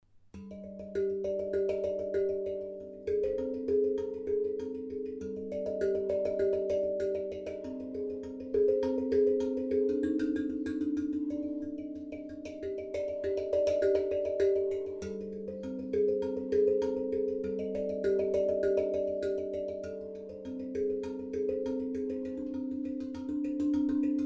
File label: Akebono impro hands